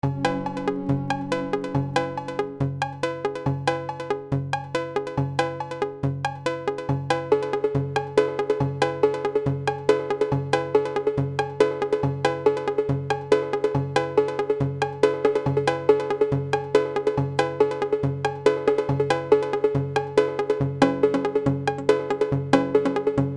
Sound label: global cowbell